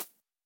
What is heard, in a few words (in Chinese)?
兔子跳跃声